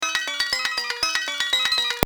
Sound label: Chime